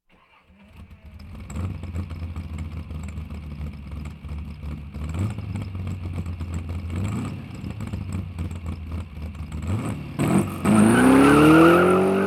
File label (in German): Sport Rennwagen Motor Anlassen Schnellstart